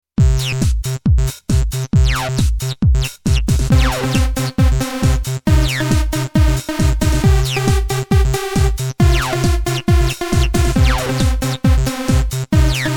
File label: Trance Pattern; Venom